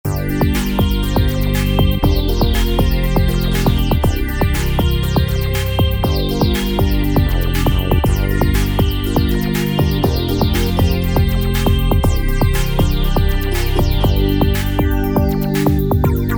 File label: RhythmWorld, Alesis Micron; Factory Setups